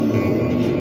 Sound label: unlock